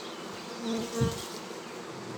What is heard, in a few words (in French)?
Vol d abeille petit bzzt; Vols Bourdn abeilles